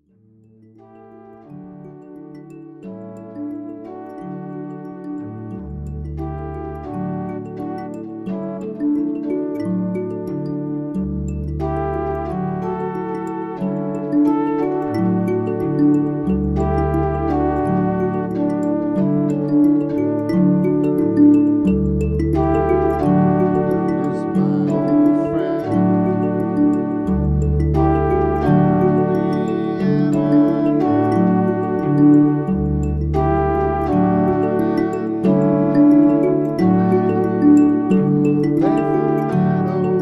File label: mbira and standard midi controller, Microtonal music and just intonation; Array Mbira; 12-edo